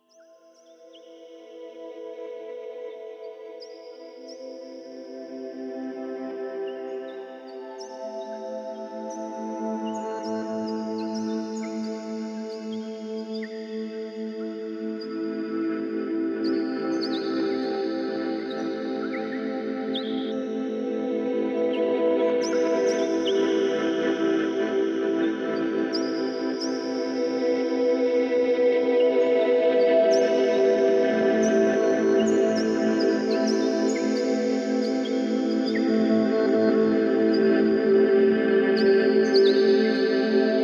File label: Zen Meditation and Natural White Noise and New Age Deep Massage Relaxing Spa Music Sleep